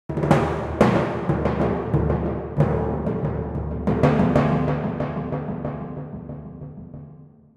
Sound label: Timp rollin